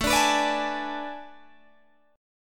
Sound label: A Major 7th Suspended 2nd Suspended 4th, Guitar, Open G